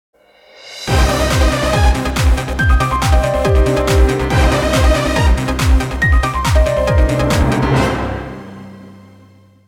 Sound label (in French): Emission link, jingle; Jingles medley